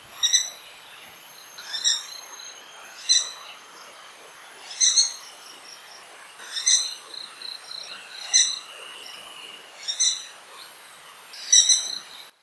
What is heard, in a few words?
Maroon Woodpecker calls Kledang Sayong Forest Reserve Ipoh Perak Malaysia